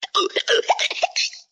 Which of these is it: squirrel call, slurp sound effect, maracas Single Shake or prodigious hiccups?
prodigious hiccups